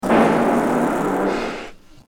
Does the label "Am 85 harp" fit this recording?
No